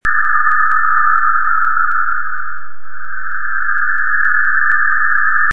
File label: pulse